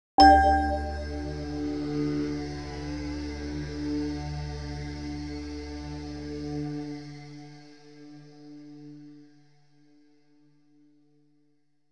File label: Bell Atmo D+3; Klaus Schulze Collection